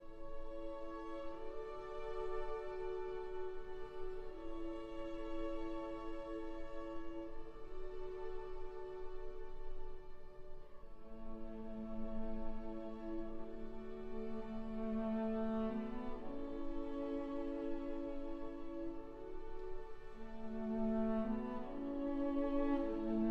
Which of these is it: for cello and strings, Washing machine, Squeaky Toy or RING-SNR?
for cello and strings